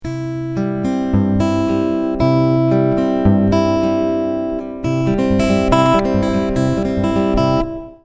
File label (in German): zupf